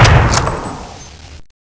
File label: awp post fire, weapons; svencoop